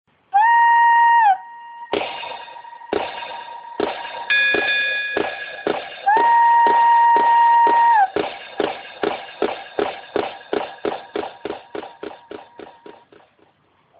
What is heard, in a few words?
music train